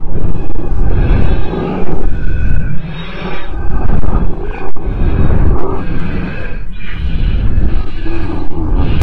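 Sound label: buffalo walk; Voices bujvol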